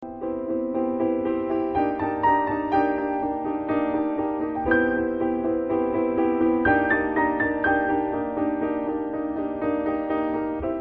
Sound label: Jingle